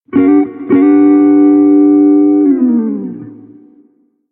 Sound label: Jazz Guitar; elements